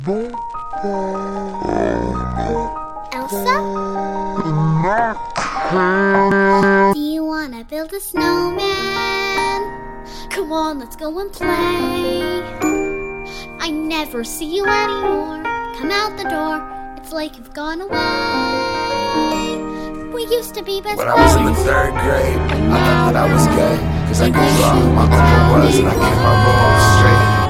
pop punk; frozen

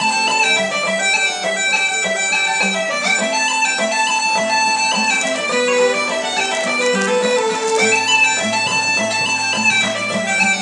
irish music

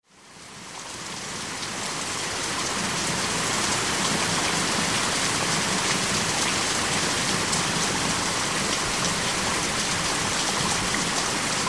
dawn chorus after and during the rain

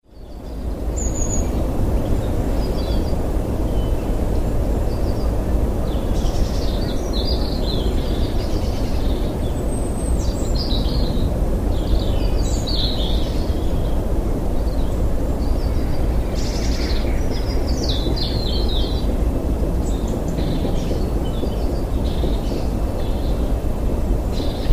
birds at pin mill

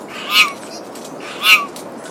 saddleback tieke mate summons call

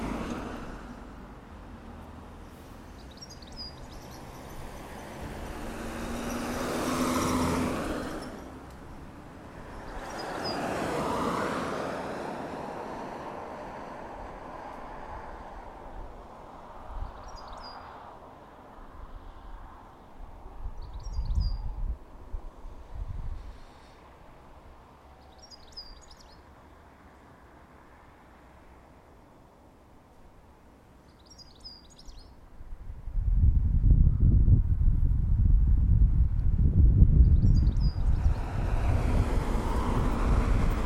bus stop birds and traffic recorded in stereo; zoomiq5

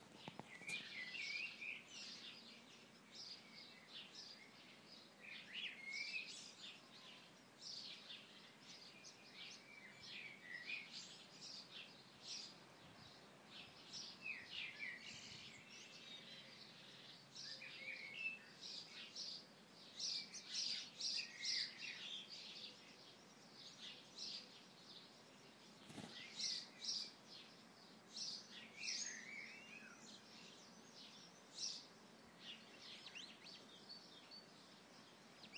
birds outside

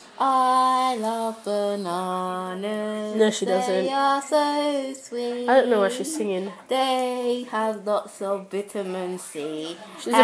random crazy singing

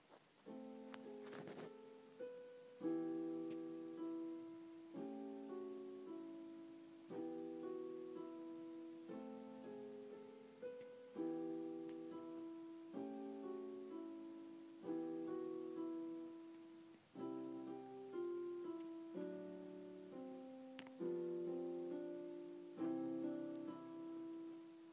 piano

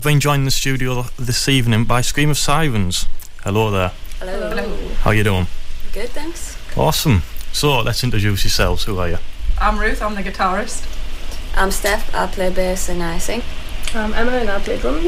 scream of sirens

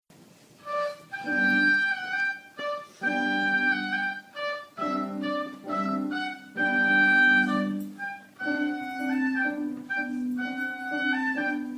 my first piano accompaniement

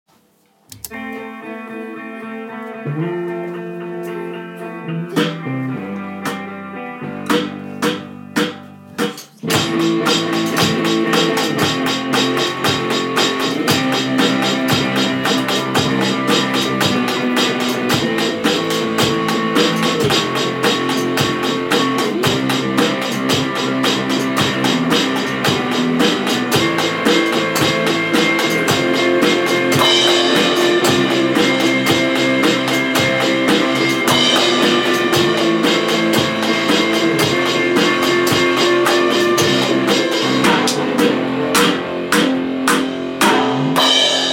drum performance

